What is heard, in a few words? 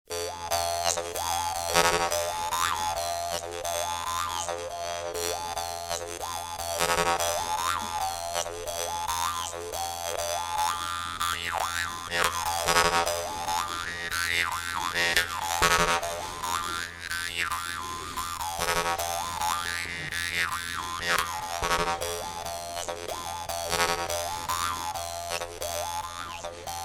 Dan Moi, jews harp